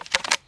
bow take arrow; deathlegends

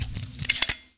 elite reload start, weapons

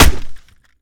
keyboard hit, weapons, melee

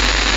static; nh demon

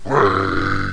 growl; shamblie; npc

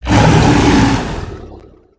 attack hit; stalker

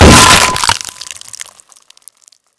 mace hit flesh; boss; sewer

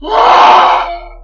alert

